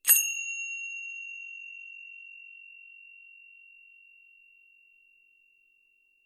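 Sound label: tibetan cymbal contact sound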